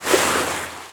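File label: move start water; RA3; Dolph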